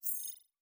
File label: Additional Weapon Sounds